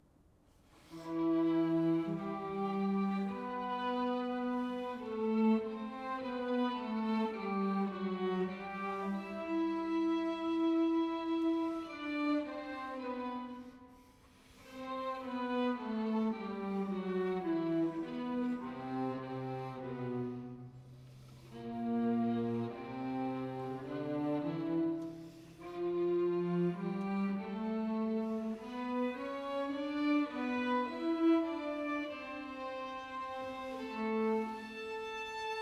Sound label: String Quartet; 10 Preludes (String Quartet No. 1)